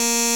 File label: buzzer button